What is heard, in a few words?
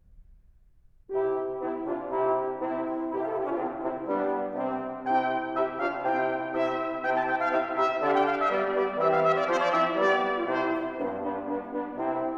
Sonatas for brass quintet; Sonata zu 4 Stimmen; Music of Renaissance, Baroque